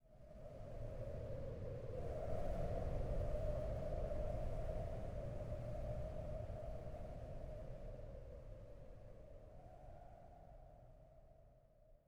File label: wind gust; Rust